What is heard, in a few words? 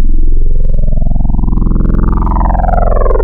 FM, Wavetables